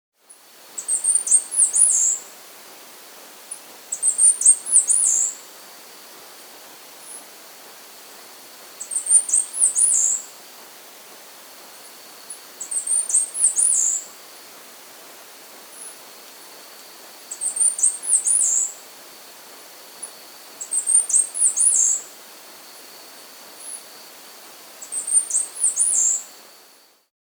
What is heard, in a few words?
Chestnut-Capped Brush-Finch (Buarremon Brunneinuchus), Andean Birds; S. America